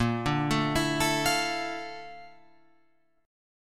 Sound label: Bb Major Sharp 11th Bb down Guitar Open F, chord; arpeggiated; JGuitar